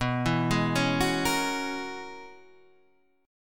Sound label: Db Minor 13th B